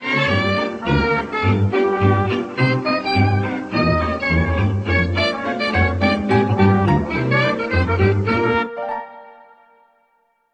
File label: 1920s Jazz, ringtones